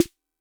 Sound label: snare; MFB-522